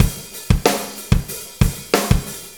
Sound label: Drum Loops; Drum Tools; Sonic Foundry (Sony Creative Software); Various Loops